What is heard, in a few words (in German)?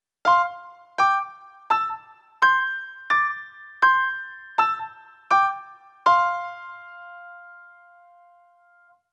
CHINESISCHE QUINTEN f c TRP